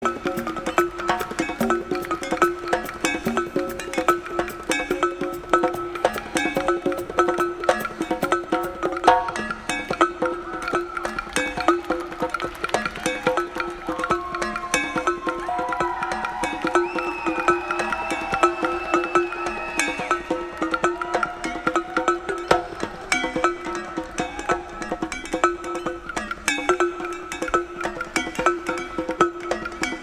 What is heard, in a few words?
Drum Jam